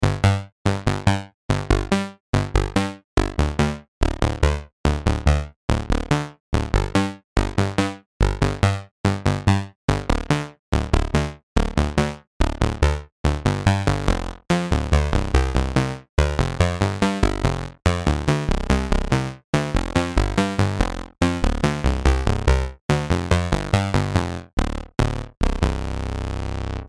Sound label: intro Bass Hi